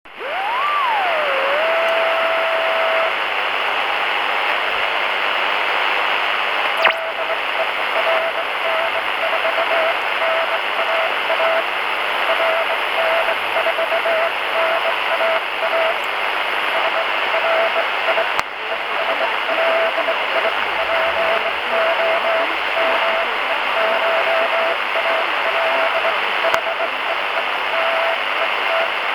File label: My RX